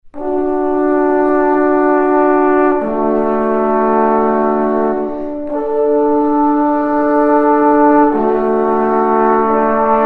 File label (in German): ALPHORNWALZER